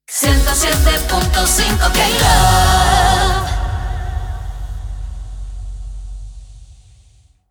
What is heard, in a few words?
Sung Jingle 123bpm Gb Major STING; KLove